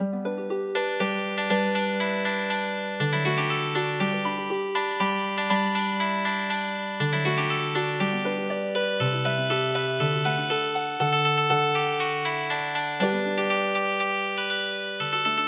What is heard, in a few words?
Healing Harp, traditional